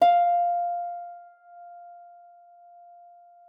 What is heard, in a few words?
E5, harp forte; Xsample VOL-8